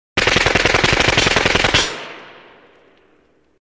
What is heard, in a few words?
9mm Uzi Automatic Fire Bullet Hits Metal; Guns & Ricochets